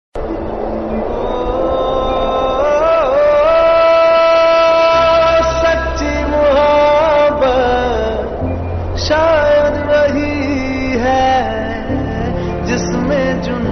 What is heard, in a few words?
Sad Version; Ae Dil Hai Mushkil